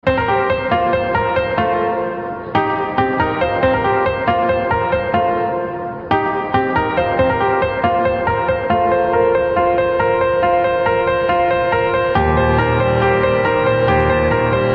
iphone; iPhone 14

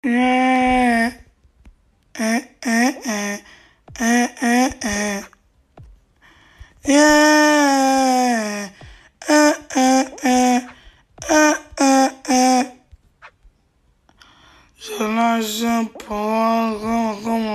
eh eh eh ehhh but sped up

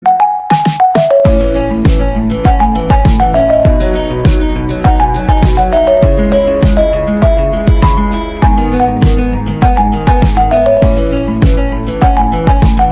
Marimba

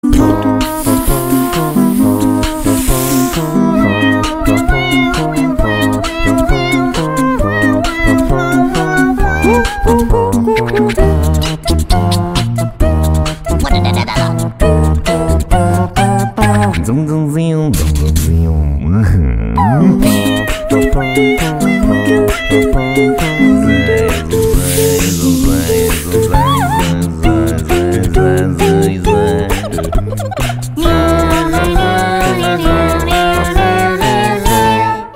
Acapella; Oggy and the Cockroaches

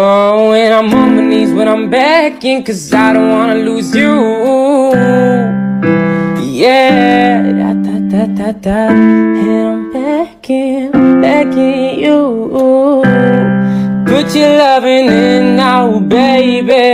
Sad Version

